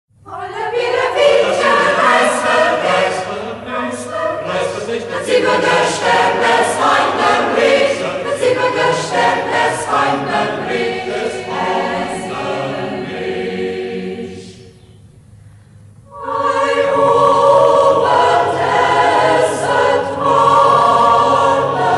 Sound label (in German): kammerchor; Ortsmundart: Schäßburg; Alle Birrebimcher; Schäßburger Kammerchor; Hegt wird gesangen